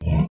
zombie pig hurt; Minetest; VoxeLibre; mobs mc

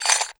armor equip diamond; Minetest; VoxeLibre

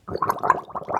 brewing complete; Minetest; VoxeLibre

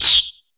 silverfish hurt; mobs; mobs mc

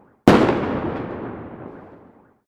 bows firework; mcl bows

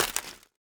throwing egg impact; Minetest; VoxeLibre

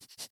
rabbit; mobs; mobs mc

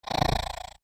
spider random; Minetest; VoxeLibre; mobs mc

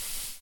dripping water lava drip; Minetest; VoxeLibre

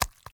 squid flop; Minetest; VoxeLibre; mobs mc

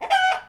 chicken hurt; mobs; Minetest; VoxeLibre

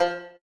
noteblock banjo; VoxeLibre; REDSTONE; mesecons

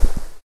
sounds cloth; Minetest; VoxeLibre

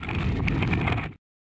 chests shulker open; mcl chests

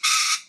parrot hurt; Minetest; VoxeLibre; mobs mc

